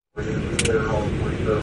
click click

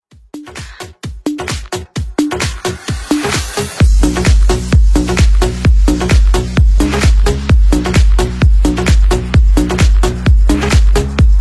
Bass Cool

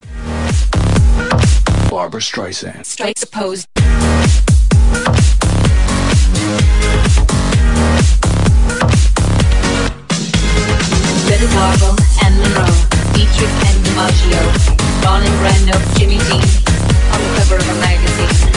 Cool Dj Beat

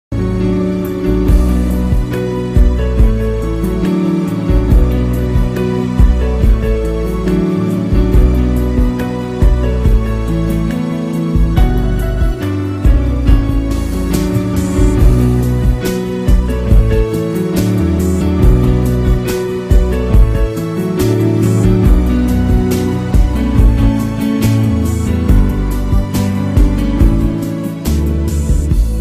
Piano Mix

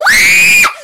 Scream Girl

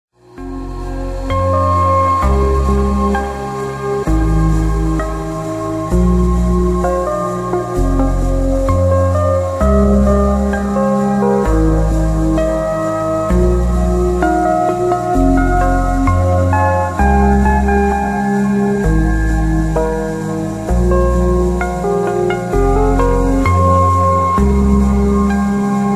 spooky

